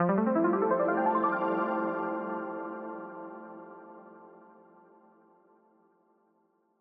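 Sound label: f major arp pluck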